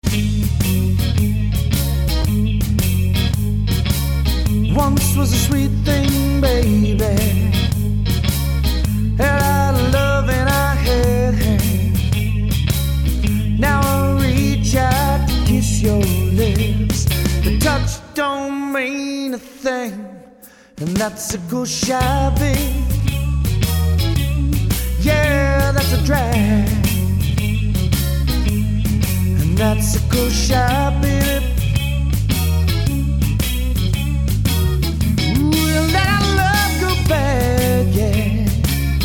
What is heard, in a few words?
One-Man Band